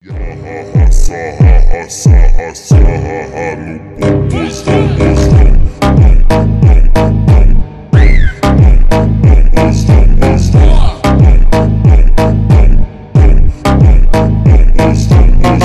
phonk

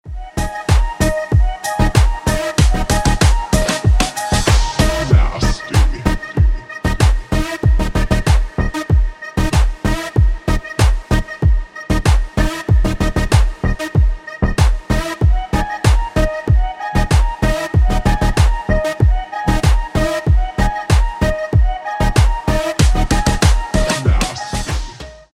slowed reverb; Клубные; Электроника; Ремиксы

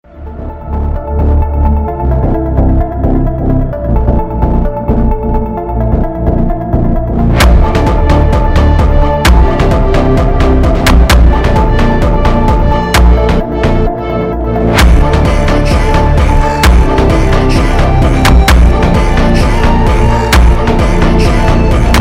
FUNK; Фонк

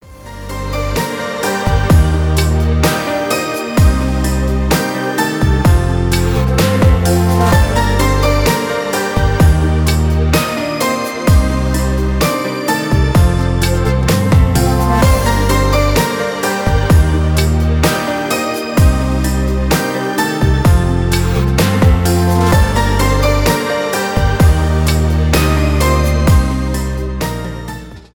instrumental

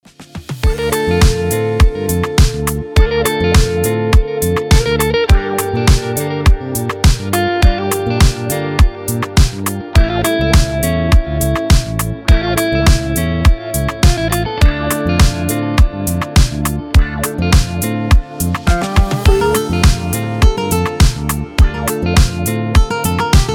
Favorite Guitar; Танцевальные

